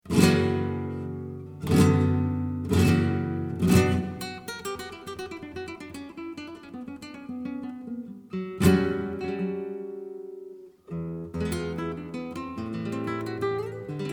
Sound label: Flamenco Melody